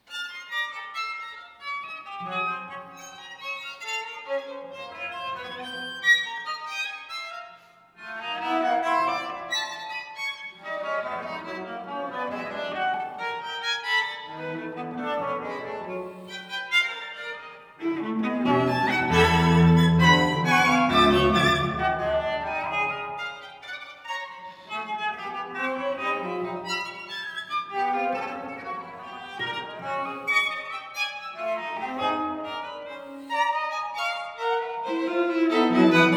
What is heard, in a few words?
String Quartet Vivace